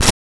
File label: Mid Perc, Data Mosh Hits; Snare - Clap - Mid; 99Sounds; Mangling Audio